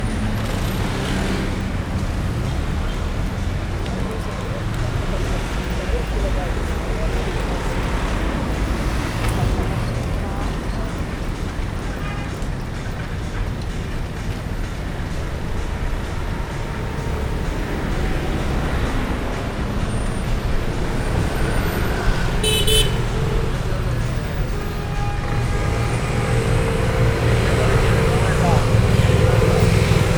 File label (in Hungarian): főútvonal lacikonyha zenéjével